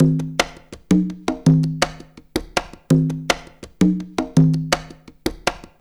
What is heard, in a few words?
Perc, Percussion Latin